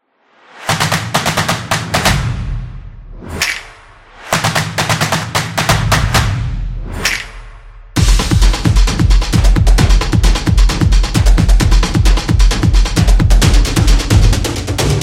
Sport Drums; football drums

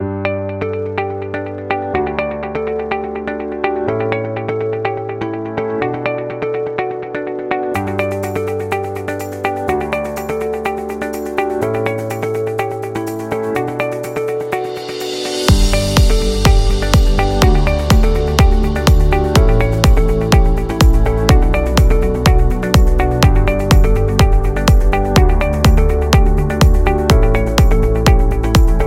Corporate Announcement; corporate interview

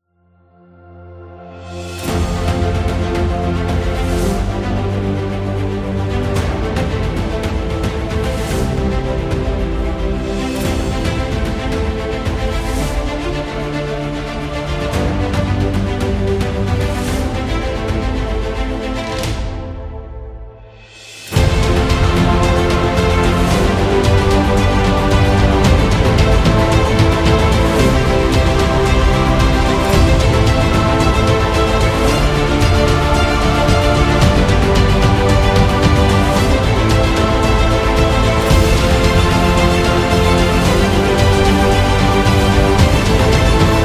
Award Celebration

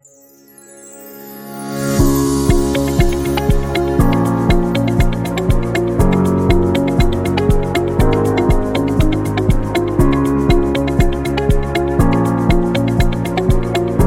Corporate Documentary; business background

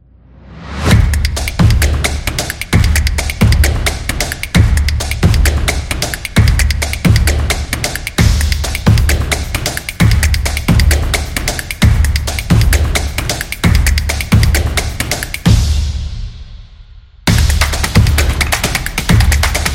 Exciting Sport, drumming action

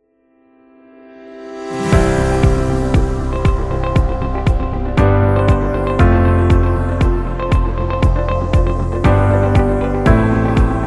Education Corporate; corporate music vol 20

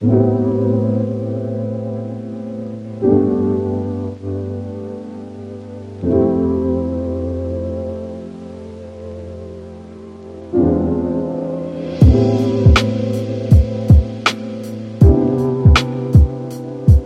Lofi

